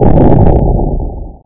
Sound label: EXPL; jinx